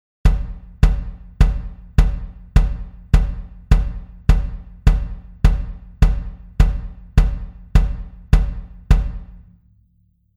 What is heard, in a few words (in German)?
Puls